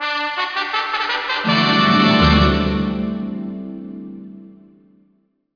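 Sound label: music sting finished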